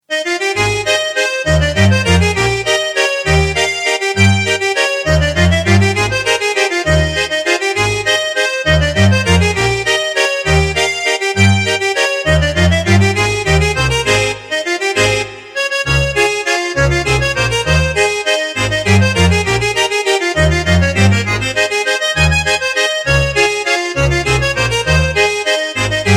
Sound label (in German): Klavierzueglete